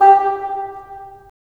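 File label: wind bassoon; LA Composer vol. 3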